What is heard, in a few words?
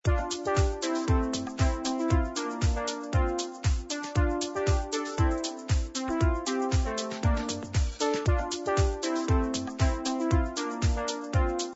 musica sin copyright non copyrighted music